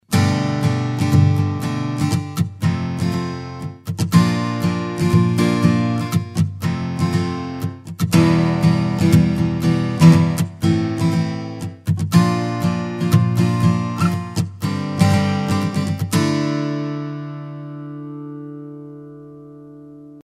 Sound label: chords